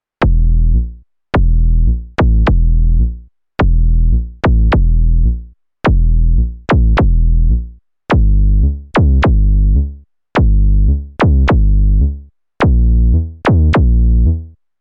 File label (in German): Volca Kick Spannend Laut